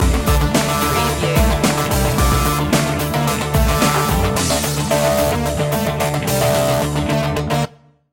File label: bang boom stinger